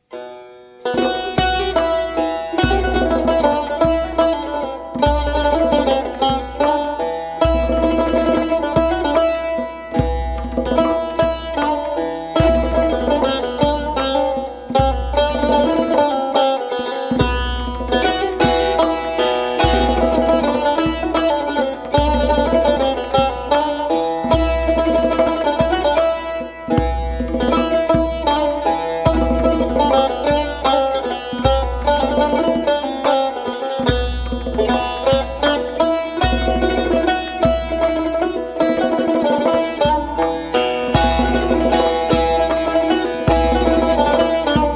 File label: Mobile Ringtone